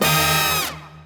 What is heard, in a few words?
Combo Pan Hit; Party Crasher